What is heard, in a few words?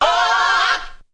gospel hit, FEMALE SINGING; VOCALS and SPOKEN WORD